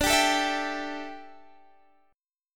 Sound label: D Suspended 2nd Suspended 4th D down Guitar Standard; strummed